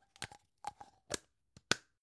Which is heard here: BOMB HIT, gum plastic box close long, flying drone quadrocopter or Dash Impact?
gum plastic box close long